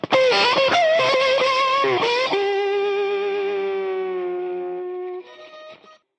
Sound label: fuzzy slide guitar